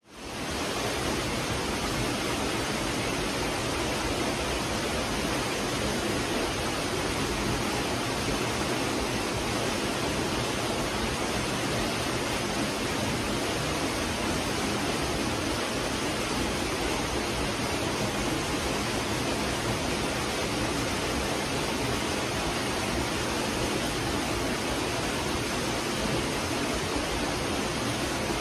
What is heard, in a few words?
Waterfall Forest Ambience